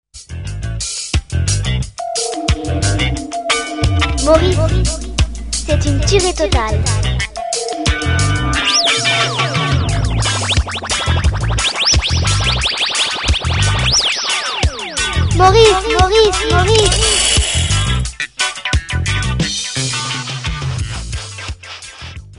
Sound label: jingle